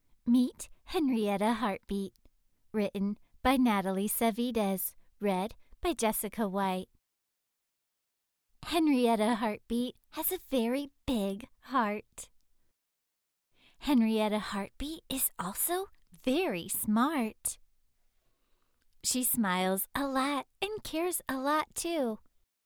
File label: Heartbeat